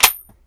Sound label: Inf Weapons Foley; Red Orchestra Ostfront 41-45